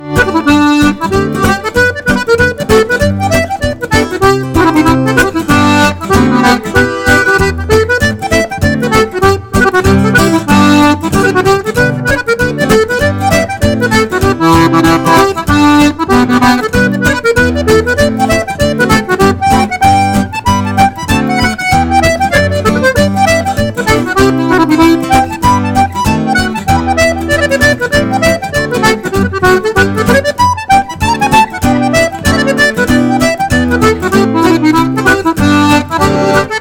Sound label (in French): Accordeon; Accordeon Turquoise